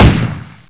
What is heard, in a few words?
ump45 shoot